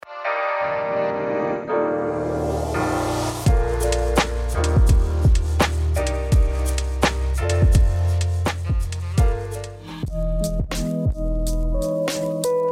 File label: Music for Restaurant and Bar